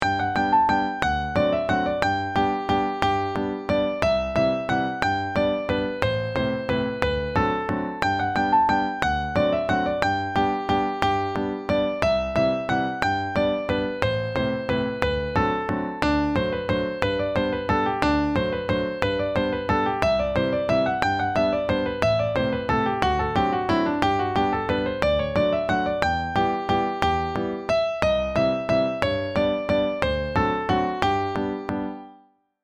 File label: Waltz; Duke of Kents; jane austen